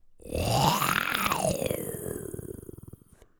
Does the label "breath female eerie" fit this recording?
No